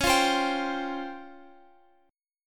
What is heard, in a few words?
Db Major Sharp 11th Db Guitar Open F; DbM#11; strummed; x,x,13,12,15,14